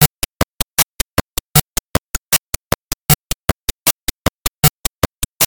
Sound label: drum test